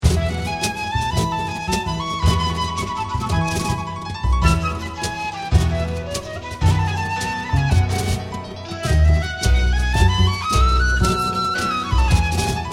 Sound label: veinte fandangos, flamenco flute